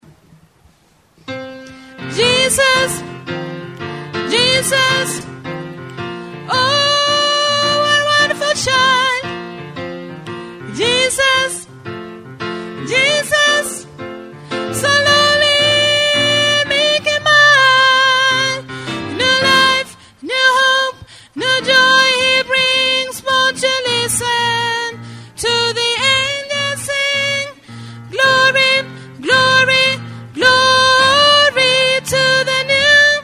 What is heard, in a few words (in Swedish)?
bas, stammor